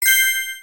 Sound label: Coin Pickup, ui; aronwk collection; ndaudio